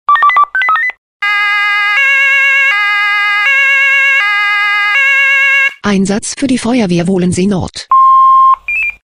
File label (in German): SMS Alarmierungstone; FW Wohlensee N